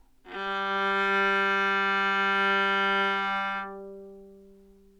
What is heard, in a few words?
modern viola open c, Anechoic; OPENAIR